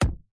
impact thud hit hard rubber

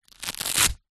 sport goalkeeper glove velcro strap pull

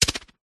warfare knife throwing weapon spin fast air

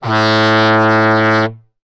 transportation boat horn dry; sound effects one

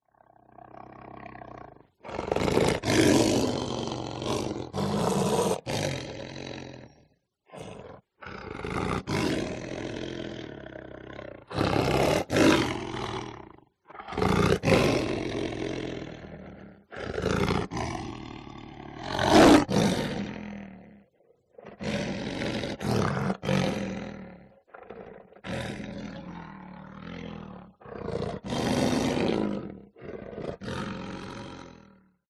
Animal Lion; audio hero

